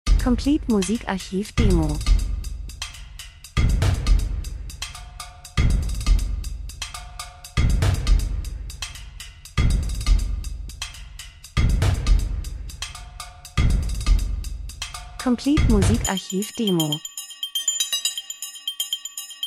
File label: drums; Variation Becken Schellenkranz, Angst, Triller, Höhepunkt, Spannung, Krimi, Dunkelheit, Film, TV, Pauken